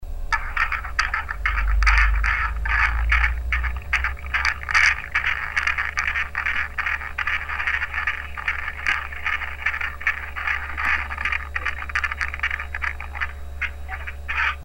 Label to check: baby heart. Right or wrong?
Right